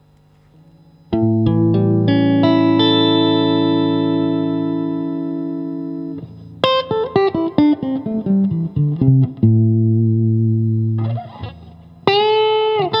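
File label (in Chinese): 60S MWS 美产铜线 清音 3档, acoustic guitar; kindman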